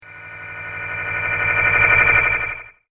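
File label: Science Fiction Tense Transition, whoosh sound effect; Sf- Tense Transition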